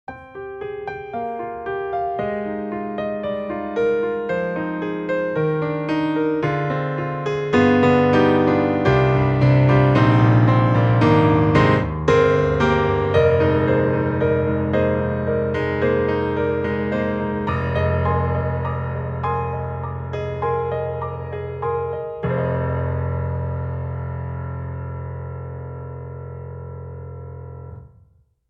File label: Rosewood Grand; Orange Tree Samples; Evolution Rosewood Grand; Pinot Noir